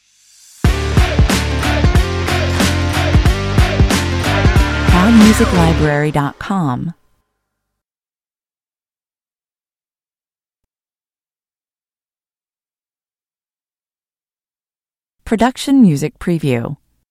brave beats (stinger)

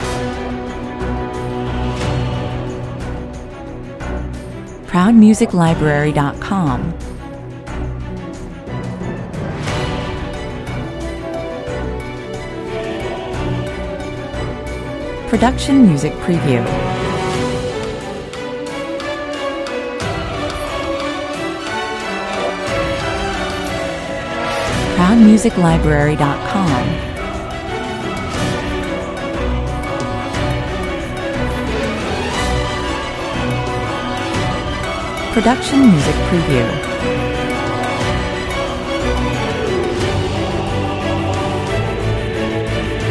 with choir

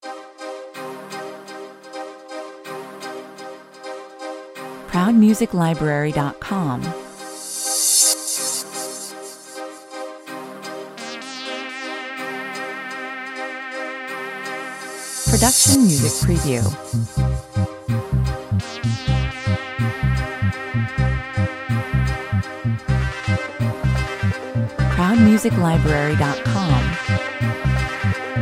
no drums 126 bpm

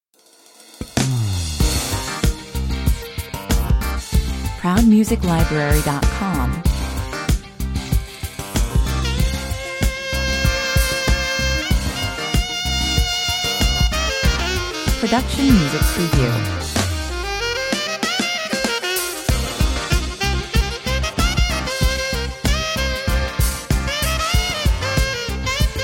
insight 95 bpm

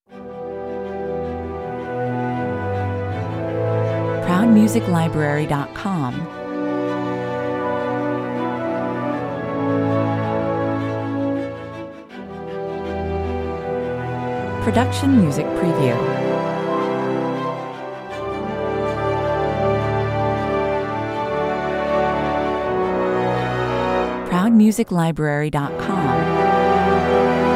to our heroes (without drums) 80 bpm

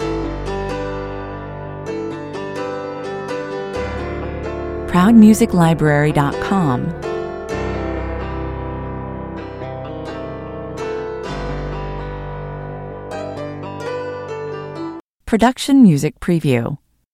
loop piano and guitar

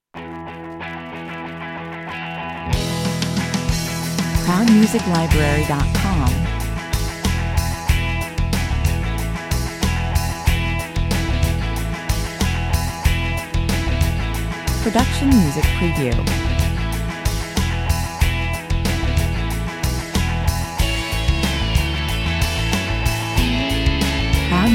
instrumental 93 bpm

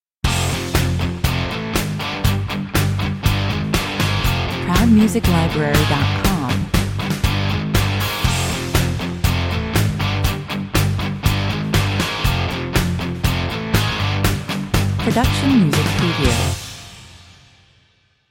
hard rock jingle 120 bpm

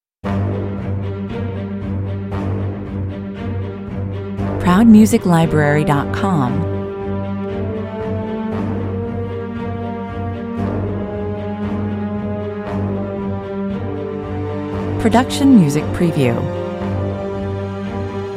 no percussion

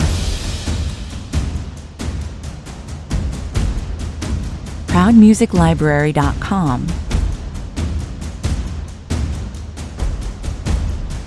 glory percussion loop

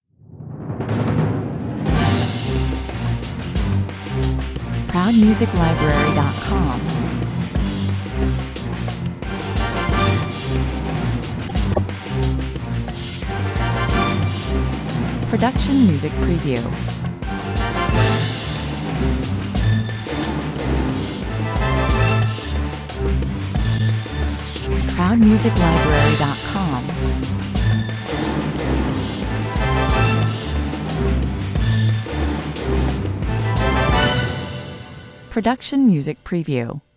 secret agent spy chase

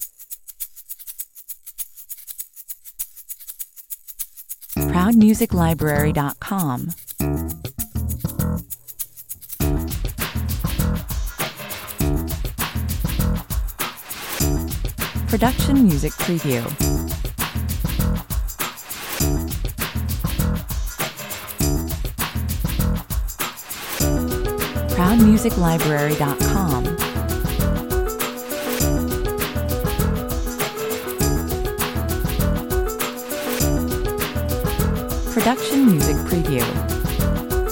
layered funk groove

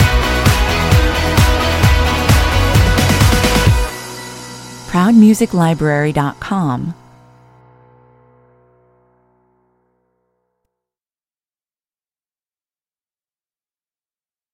neon guitars stinger

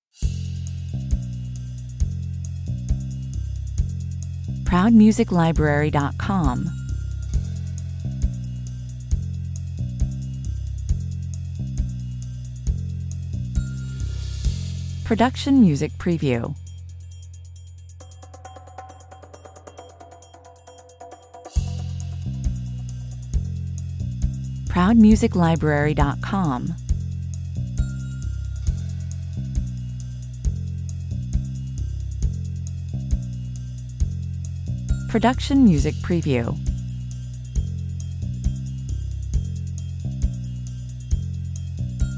charmed life (bass drums perc no tymp)

